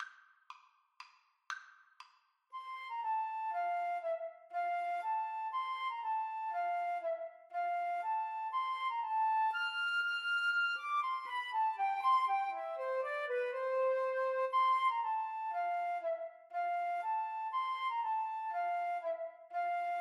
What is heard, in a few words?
minuet, flute duet